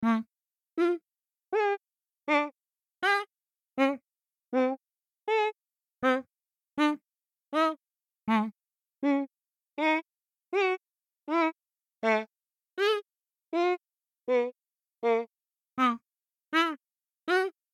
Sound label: stringy Voice, chimeras